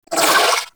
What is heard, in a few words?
Vocal Pain; Warframe; Moa Satyr